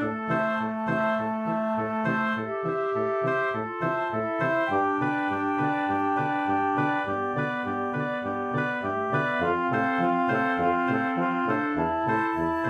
town; Fate The Traitor Soul

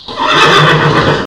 whinny, horse; Fate The Cursed King; MONSTERS

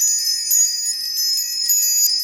crystal; Fate Undiscovered Realms

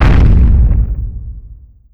big step; Fate

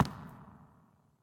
footsteps barrel; Fate The Cursed King

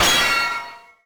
break

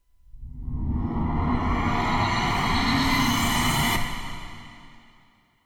lift; Monster girl quest Part1,2,3; Visual Novels

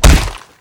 wood Flesh; Fate

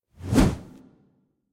boulder throw; Fate The Cursed King; ITEMS

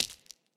footsteps hive; Fate The Cursed King

